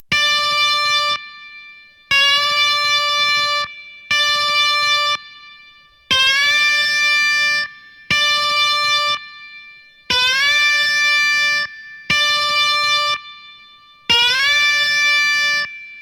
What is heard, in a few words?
bending execise, guitar; String bending